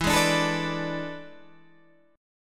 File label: E 11th, Guitar, Open G